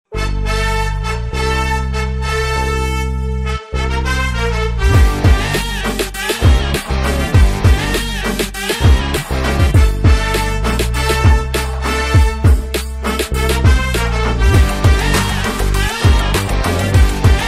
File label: Title Song Promo BGM Ringtone; Pakka Commercial